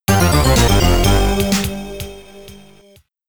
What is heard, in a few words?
Level Complete!, amiga